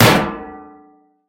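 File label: heavy hit on metal lid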